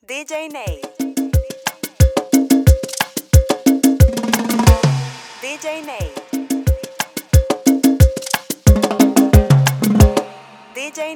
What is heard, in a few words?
FILL SALSA